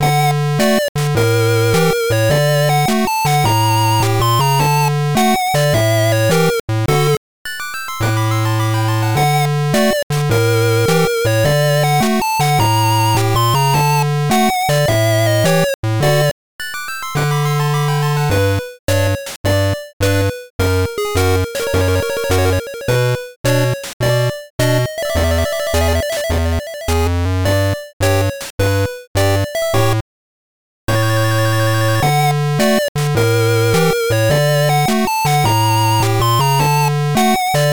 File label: 8bit Trick Or Treat